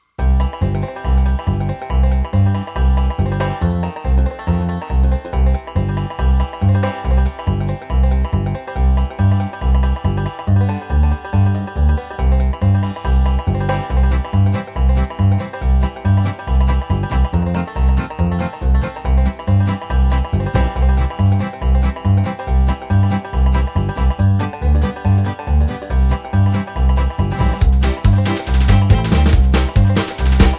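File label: BlueGrass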